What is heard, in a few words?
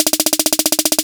808 Snare